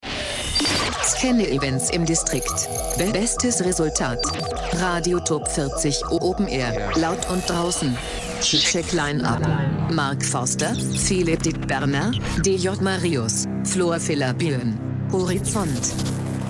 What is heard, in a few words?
news radio top40